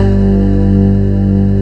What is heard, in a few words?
STACK BASS; AKAI S6000 CD-ROM - Ultimate